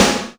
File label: TRASHY SNA, SR-16 DRUMS; Drum Mashines; EdgeSounds